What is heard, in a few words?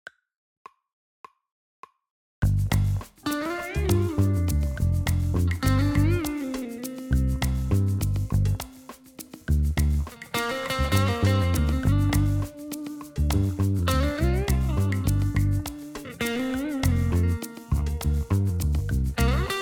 drumless